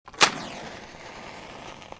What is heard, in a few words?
ignite; CLANNAD FULL VOICE; Key